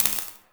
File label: Laser Skin Printer Laser Stop; Warframe; Ambience; Entrati